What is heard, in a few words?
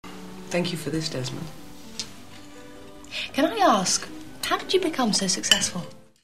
voice